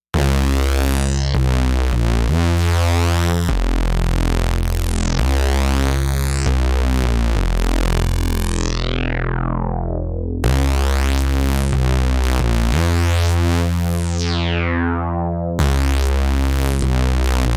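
MOOG MAVIS Blown Out with Roland RE 301 Chorus Echo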